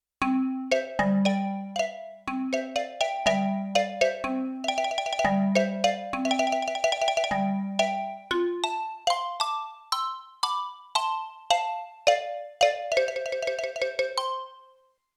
Marimba Set, Yamaha TX16W; Orchestral Performance 1

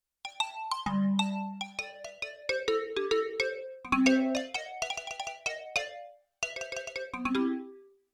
Marimba dual, Yamaha TX16W; Orchestral Performance 1

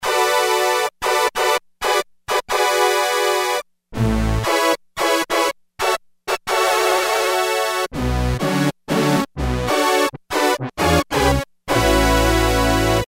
Stab Brass 8va, Roland MKS-70

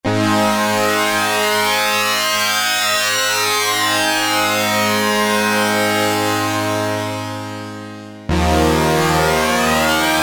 Slow Sync Sweep; Sequential Circuits Prophet-5 (Ver.3); Factory Patch

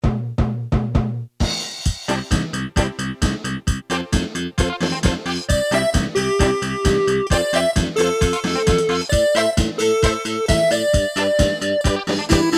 Rock, Casio CT-370, Rhythms

